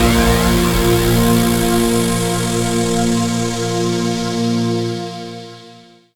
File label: SYN DANCE; Sound & Vision - Gigapack II